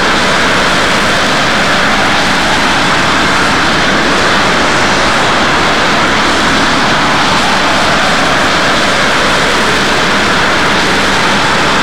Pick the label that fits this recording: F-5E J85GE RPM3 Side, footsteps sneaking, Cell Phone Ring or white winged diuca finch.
F-5E J85GE RPM3 Side